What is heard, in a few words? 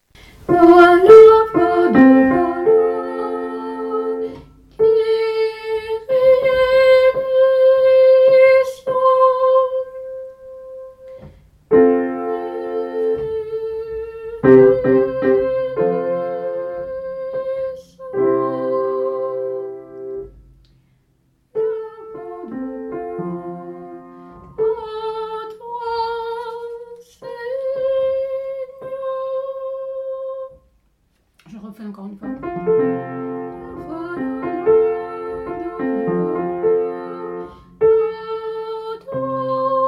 Kyrie Tenor